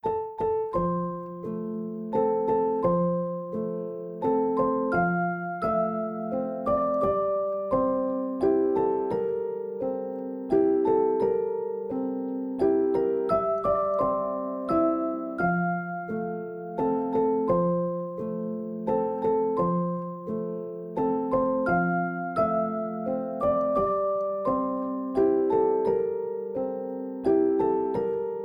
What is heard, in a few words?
Brahms Lullaby; Schlaflieder Fur Babys